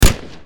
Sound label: Deagle